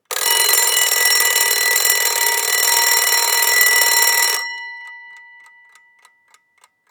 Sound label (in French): Reveil mecanique sonnerie courte